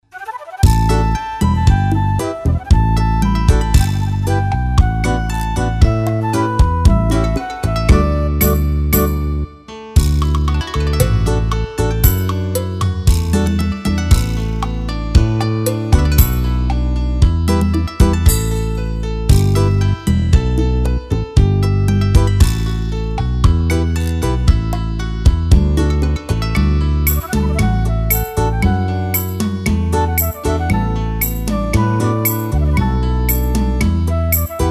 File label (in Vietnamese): nhac beat